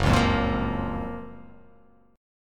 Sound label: F sharp Major 7th; chordshape; down